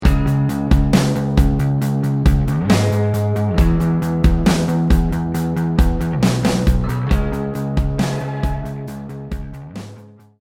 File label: round ten sec count, music